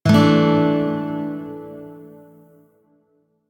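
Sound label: Shawzin Pentatonic Major Chord; Music; DE; Warframe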